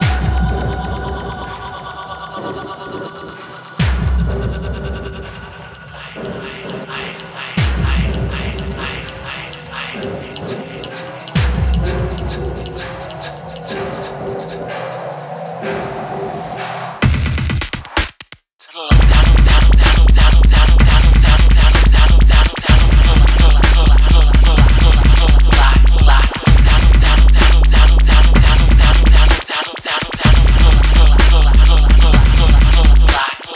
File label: Cell Drums